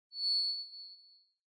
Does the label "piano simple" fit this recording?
No